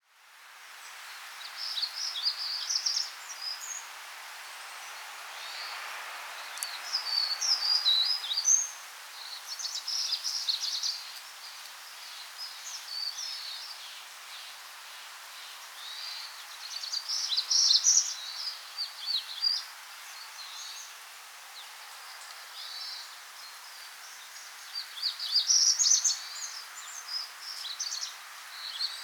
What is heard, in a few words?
Townsends Warbler